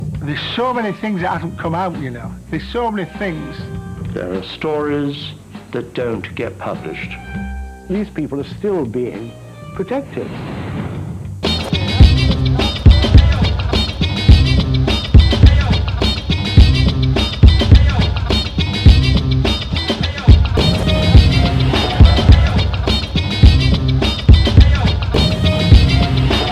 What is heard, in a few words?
instrumental